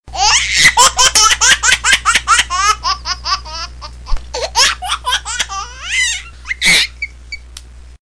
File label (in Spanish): bebe riendose